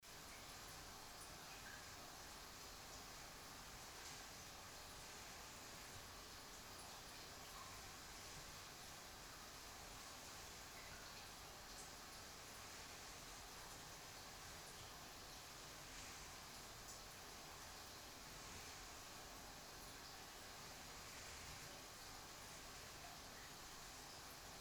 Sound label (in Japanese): 耳奥舐め準備マッサージ, ASMR